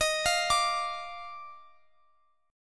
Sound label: D sharp Major 7th Suspended 2nd, Guitar, Open F; D#M7sus2; arpeggiated